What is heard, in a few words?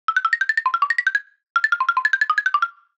Random Blocks; musicradar; cartoon caper